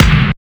LOFI GATE K; Drums In Your FACE!

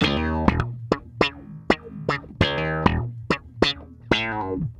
Bass Loop, Ambient Grooves

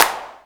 new dance clap, drum machines

